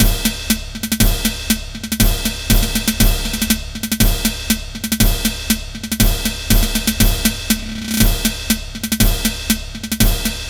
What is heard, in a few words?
Drums Only; Pikmin Adventure; Spring